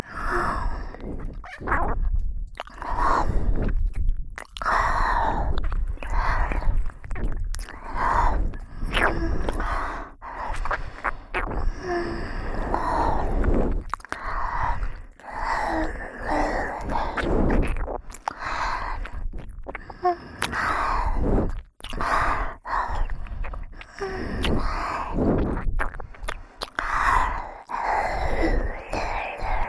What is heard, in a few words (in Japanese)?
左耳舐めループ（耳塞ぎver）